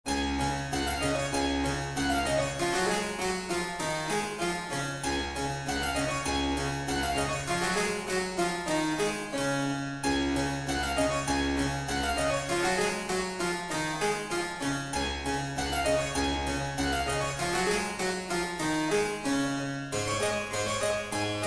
Sync Wavetable Soni

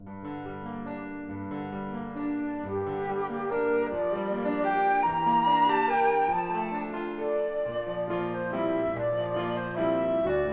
Sync Wavetable Soni

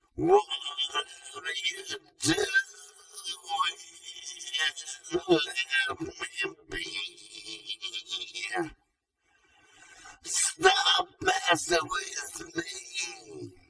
Singing Voice

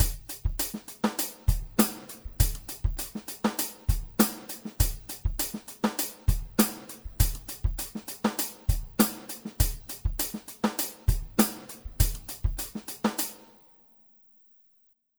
Wave Drum SEP MIX; Decomp Mag Zero Phase TR

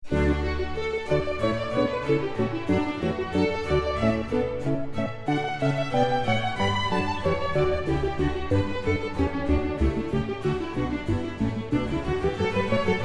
Sync Sine Soni

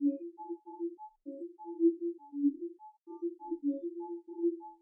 Bongo

